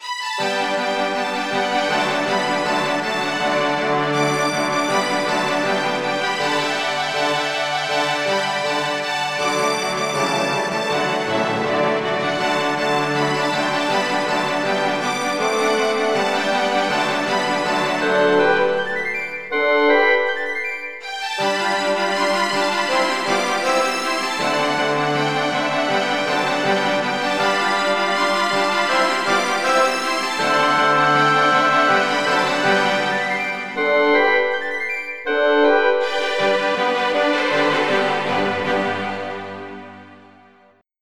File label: flute